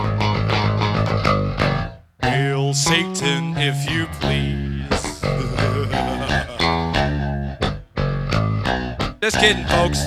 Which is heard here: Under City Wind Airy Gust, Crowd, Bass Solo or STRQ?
Bass Solo